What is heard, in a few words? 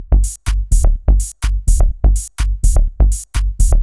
breaking bass tech loop; tech house